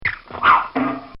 Comical Sound Series Zip Bang; Funny Sounds; Bobby Cole

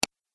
Pc Mouse Single Click

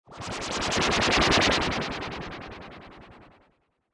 Ufo Warp Spinner; Spacecraft Sound Effects; science fiction sound effect

